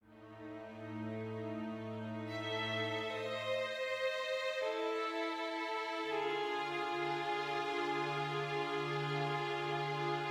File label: dark med; Quivr; PS4 Windows gamerip 2016